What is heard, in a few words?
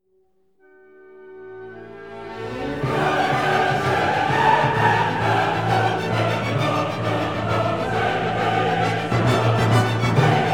Symphony 9 In D Minor Choral Presto Allegro Assai; Beethoven; Op. 125